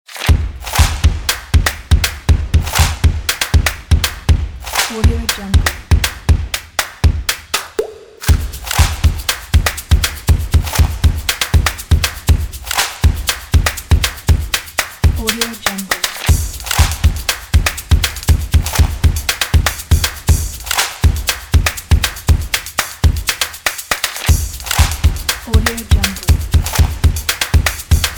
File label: percussion